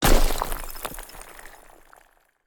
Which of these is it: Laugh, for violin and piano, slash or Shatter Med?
Shatter Med